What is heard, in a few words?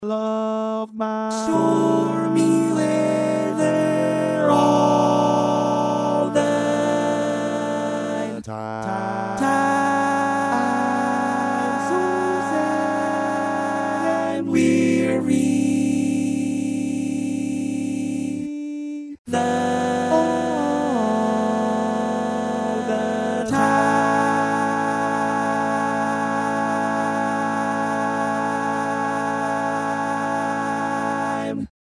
Tenor, barbershop